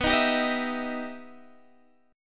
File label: E Minor Major 7th